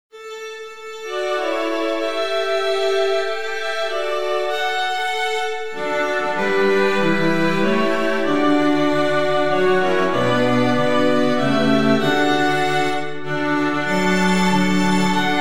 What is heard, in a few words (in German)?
streichquintett